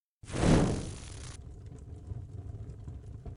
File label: fire ball pop up spin answer